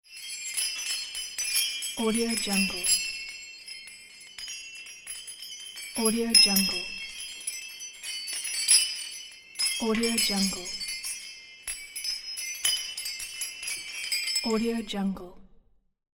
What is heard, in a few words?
terrible bells